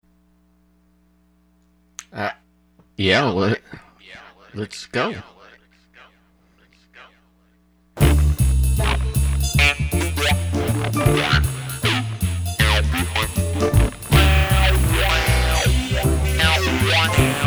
Modern Jazz Soundcheck

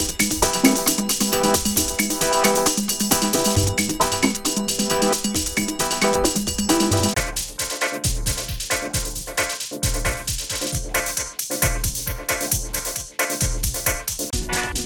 Dubstep; Hobo Jungle

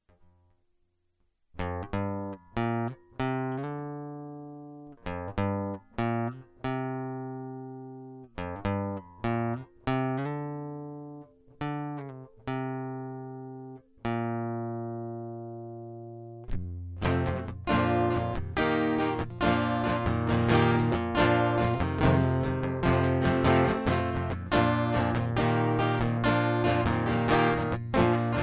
Guitar Loop

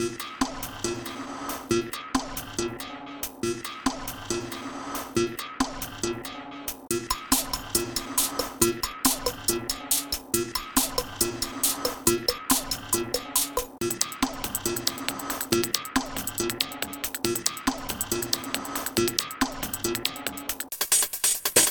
Dubstep; bordem does not compute

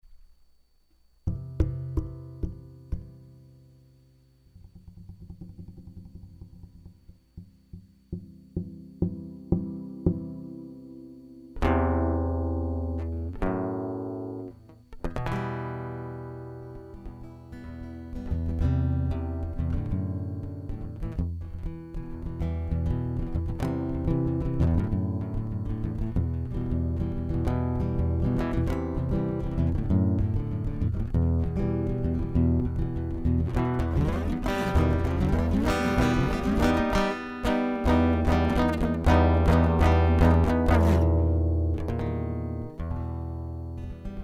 Guitar

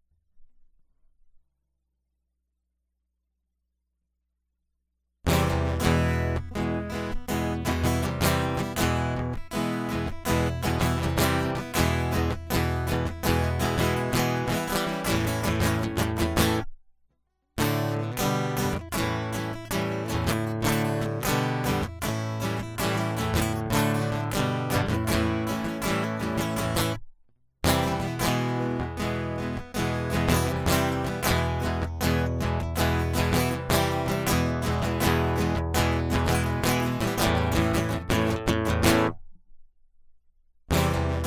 Guitar Loop; Aerosolized

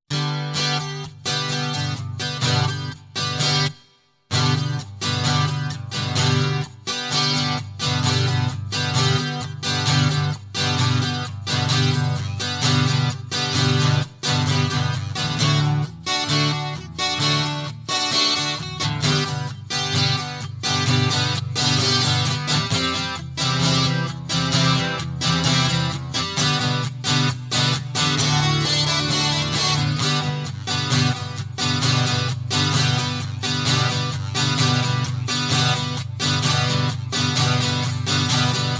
Guitar

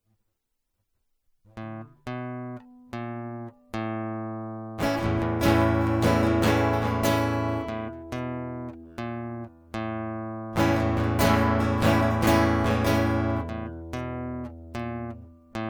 Guitar Loop

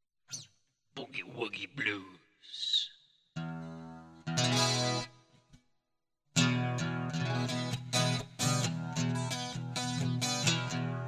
Boogie Woogie Blues

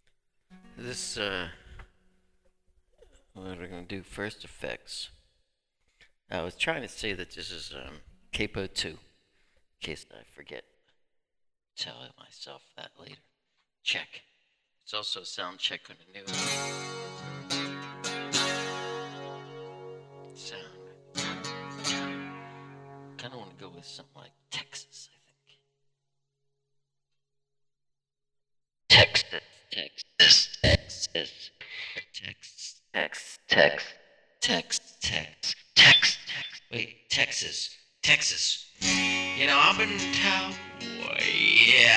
Soundcheck

